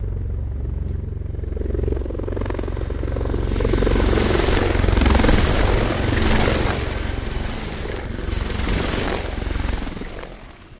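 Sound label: Apache X5 Fly By Fast Right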